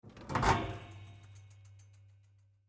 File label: Couch Bed Pull Out; Blastwave